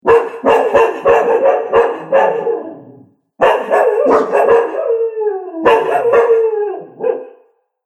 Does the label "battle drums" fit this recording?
No